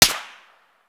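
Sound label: supersonic snap, bullet snap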